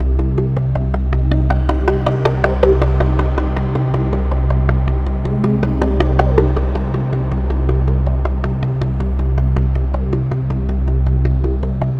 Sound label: AMBNT 160; eJay; Ambient